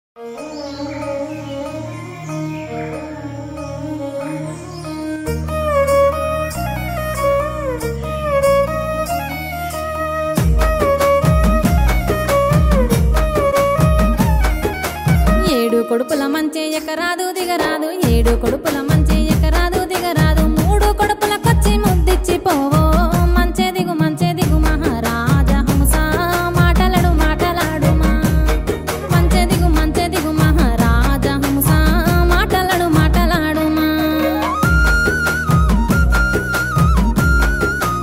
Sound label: Folk Song, Telugu; Telugu Private Songs